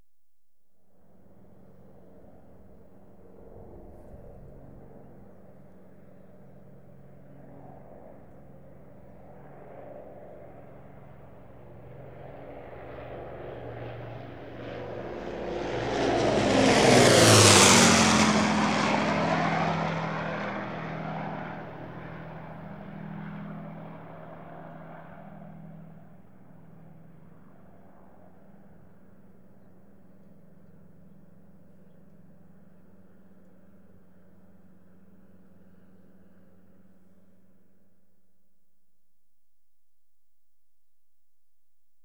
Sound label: Avro Lancaster World War II Bomb; Aircraft; BBC Sound Effects Library